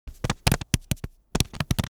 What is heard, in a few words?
Cartoon pop corn